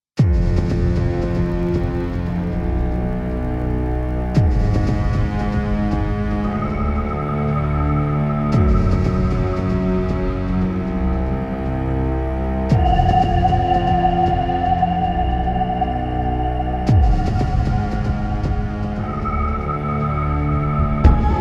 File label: Inside cry